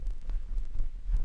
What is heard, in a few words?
quiet vinyl; Scratching and Turntablism; DRUM LOOPS and BREAKS